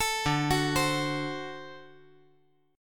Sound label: D 7th, down